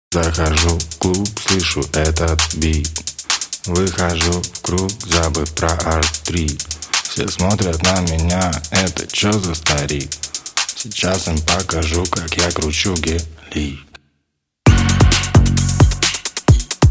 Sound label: DRUMS